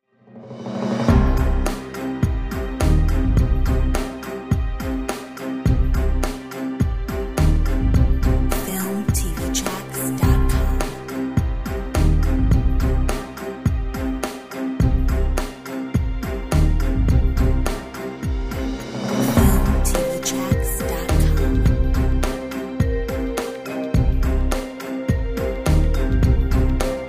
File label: news ticker bed